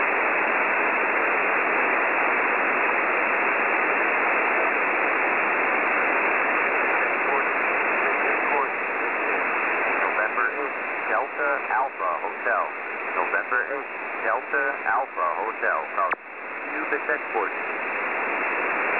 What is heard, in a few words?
websdr recording 7277.0kHz